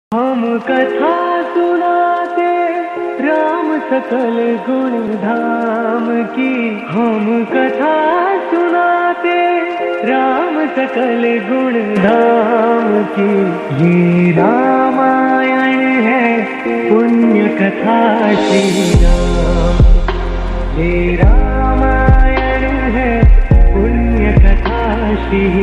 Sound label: bhakti ringtone